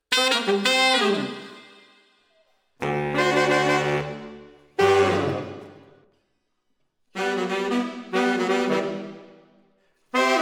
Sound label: SAXES